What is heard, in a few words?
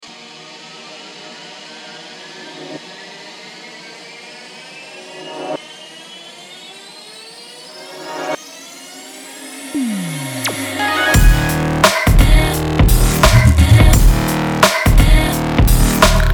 Dirt Fashion Electro Sport Big Beat